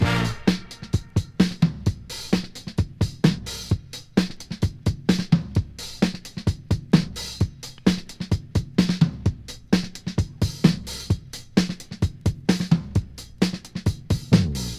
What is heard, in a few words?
130 bpm drum loop sample a sharp key, Free breakbeat sample - kick tuned to the A# note.